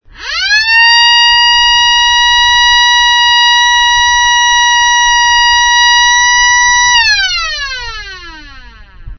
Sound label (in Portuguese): Sirene